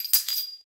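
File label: Bullet shell Shell Eject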